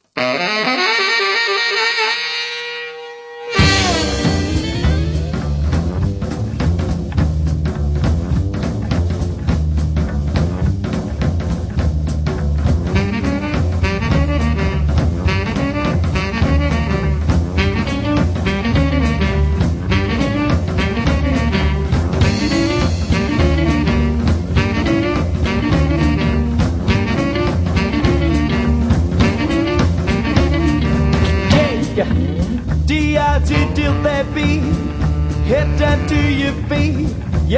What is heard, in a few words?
dig that beat